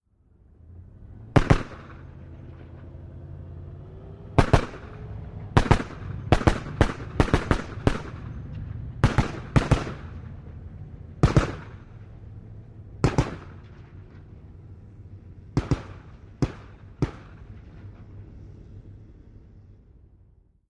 Apc: Exterior Driving And Firing. 50 Caliber Machine Gun. Mono; armored personnel carrier